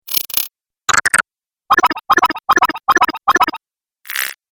Sci fi Activation Sounds; Science Fiction Sound Effects

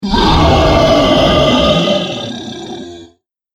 Dinosaur Demon Roar; Dinosaur Sound Effects; animal

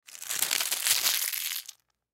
Wax Paper Crumple; Kitchen; Household; Blastwave

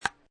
Ping Pong Table Tennis Bat Hit Ball; Lynne Music

